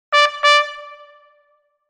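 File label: signals, bugle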